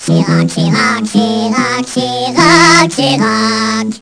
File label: anthem; Swedish